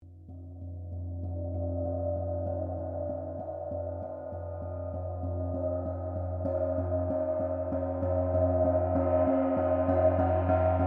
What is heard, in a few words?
GONG CHINA MEDIUM CRESCENDO, Chinese gongs; Cymbals; Musical